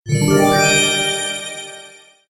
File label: Sms Tone